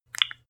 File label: landline click